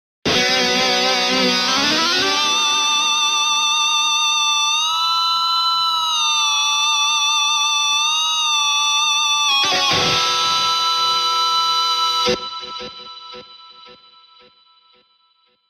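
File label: Electric Guitar Going Feedback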